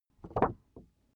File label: paper wobble high short